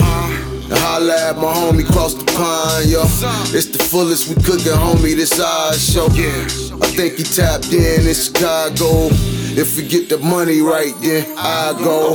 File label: Hip Hop Before